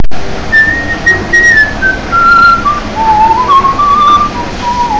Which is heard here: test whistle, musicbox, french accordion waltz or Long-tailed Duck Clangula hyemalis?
test whistle